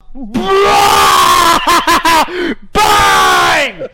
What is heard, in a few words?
bwahhh